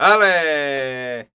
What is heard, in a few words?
flamenco vocals, male